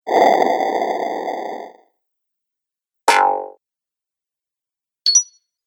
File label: Videogame Fx; Sound Pack 2; Video Game Sound Effects